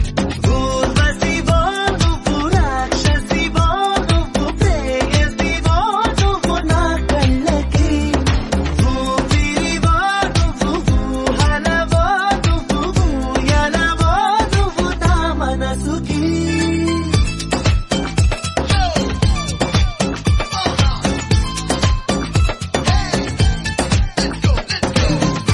Telugu Song Ringtone

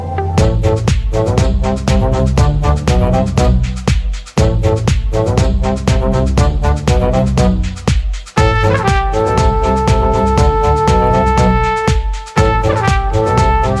BGM Title Song Ringtone

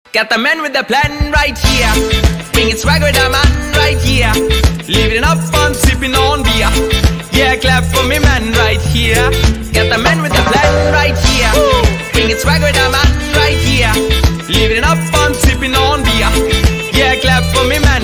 English Song Ringtone; Master Movie

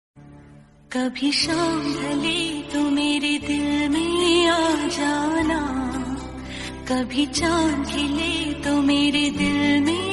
Female Version Ringtone

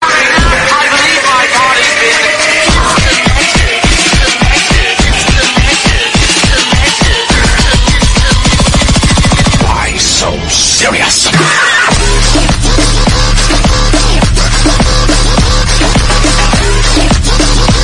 Attitude Ringtone; Joker Boy

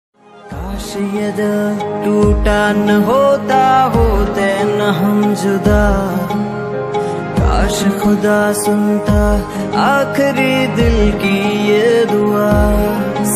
New Hindi Sad Song Ringtone

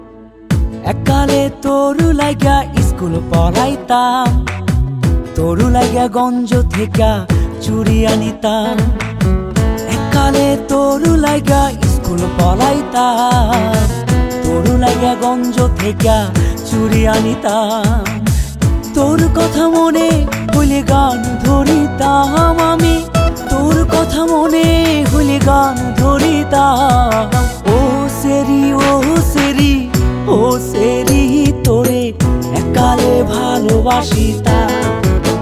Bengali Song Ringtone

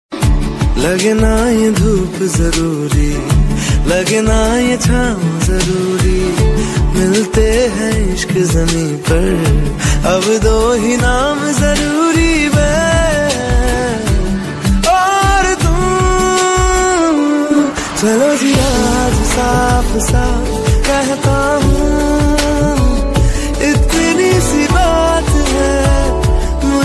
Hindi Ringtone

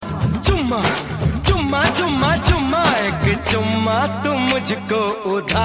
Hindi Song Ringtone

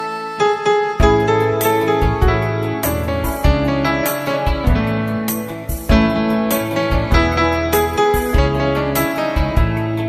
Rabindra Sangeet Instrumental

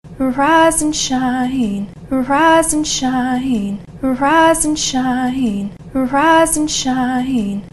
Rise And Shine Ringtone; ringtones

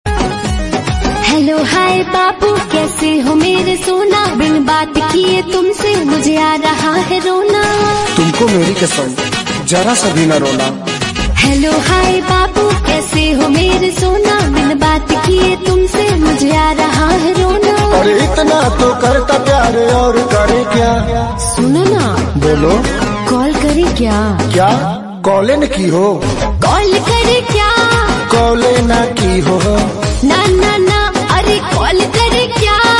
Bhojpuri Song Ringtone

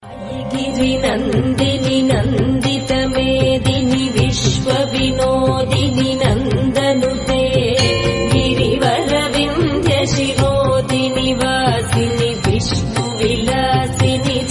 Bhakti

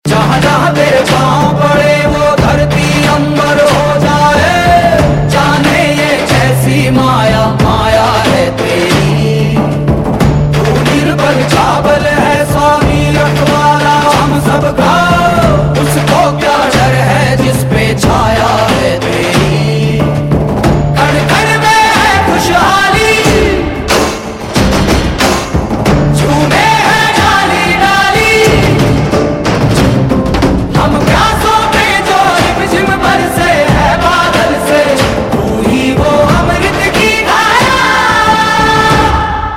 Hindi Song; Ringtone

